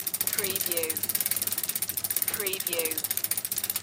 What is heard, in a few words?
Bike Chain Spin Moderate Loop, rattle; lynne-publishing